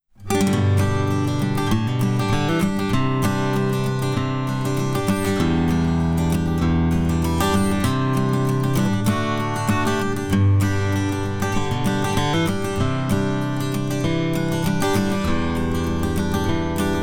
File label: Ac Guitar Strum